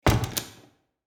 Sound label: Household bedroom door close